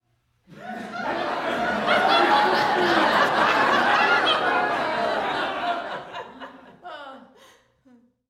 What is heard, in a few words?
Short Laughter Staggered